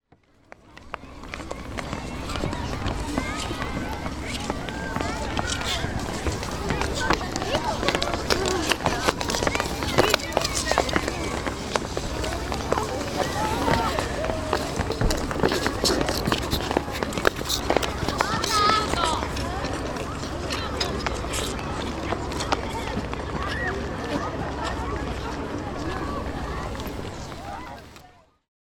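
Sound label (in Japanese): kodomotachi ga sukeeto wo shiteru oto; 永明小の子供たちがスケートをしてる音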